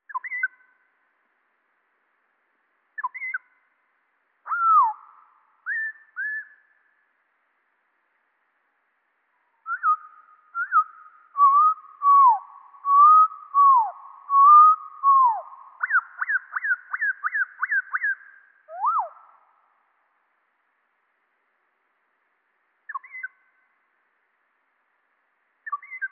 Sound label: PALLAS; DEUX OCTAVES EN DESSOUS